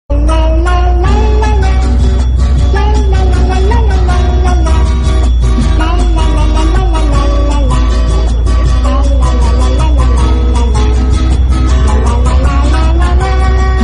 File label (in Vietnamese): Nhac Chuong Hot